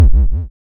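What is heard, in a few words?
waveform FM triangle sine envelope silence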